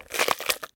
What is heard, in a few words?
crunch; game